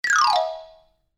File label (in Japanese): 場面切り替え 木琴 下り 速め; 場面切り替えや心理描写; 演出効果音